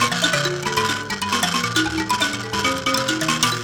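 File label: PLK Bass Mbira; Roland LCDP11 Africa VOL-1; M'biras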